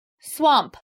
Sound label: swamp